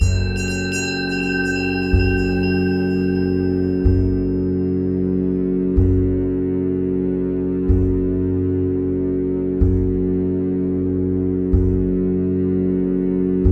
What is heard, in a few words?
Zen Wave Gb; Mike Cirillo; Musical Self-Actualization; Mike Cirillo Method TMSA